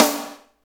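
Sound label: snare piccolo; Roland L-CD701; Snares 1; Sn Modules 1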